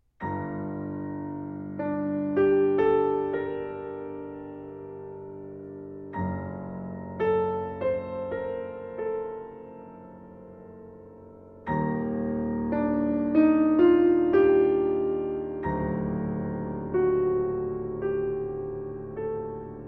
Solo Piano

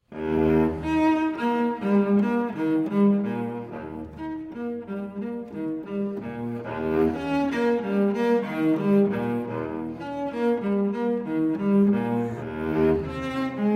Unaccompanied Cello Suite E-Flat Major Prelude; BWV 1010

